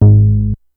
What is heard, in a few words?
Synth oneshot Ab, Synth Bass One Shots; Slate Digital BASSHOLE Sample Pack